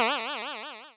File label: Cry